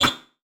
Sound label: Multimedia Internet CD-Rom Flash Buttons Hard